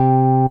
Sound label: Korg DW 8000 Organ C3